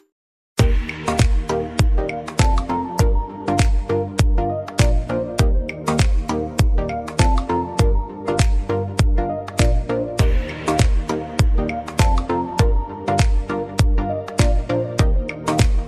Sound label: Ringtone For Android Mobile Phones